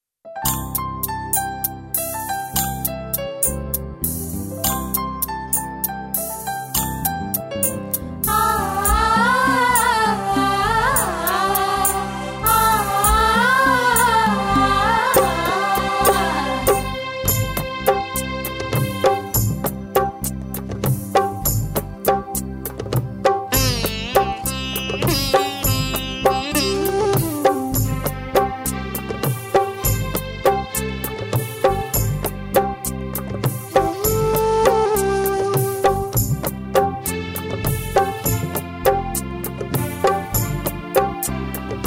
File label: Karaoke Music